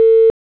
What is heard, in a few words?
Busy, ring; LiveChat; SDK